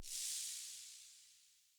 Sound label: Ship Descent Elec FX; Ships